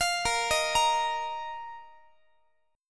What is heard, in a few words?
A sharp Suspended 4th A sharp down Guitar; x,x,20,20,19,21; 4A,4E,5A,5D,5G#,6C#; arpeggiated